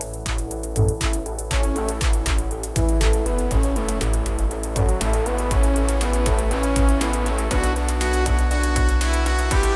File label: electronic synth lead, bella ciao; musiclm; melody conditioning